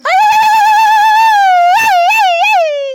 Grito

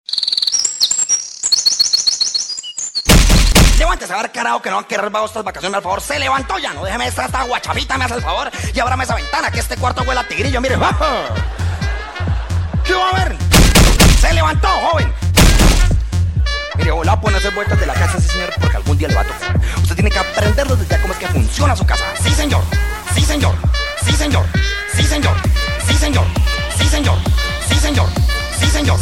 Despertador

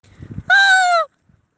Grito de puta; Pito

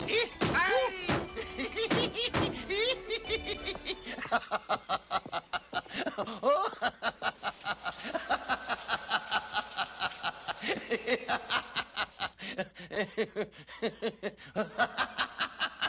Risa; Simpsons; Burns

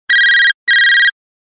Clasico Tono Celular

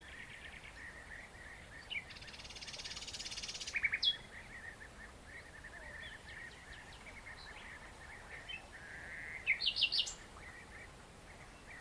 Sonidos Del Campo

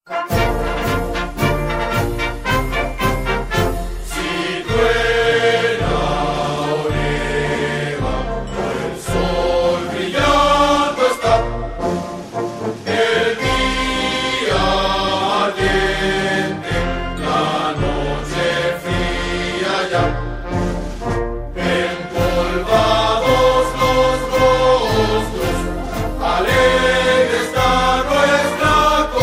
Himno; blindados

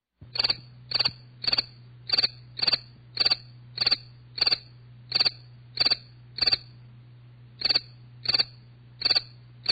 Grillo En Tu Cucu

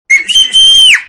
chiflido fufifiu

